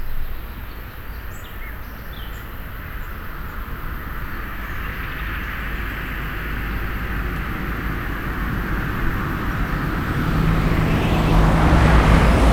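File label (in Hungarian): ketten balra madár füty, kanyar; szallastol keletre; thassos 2016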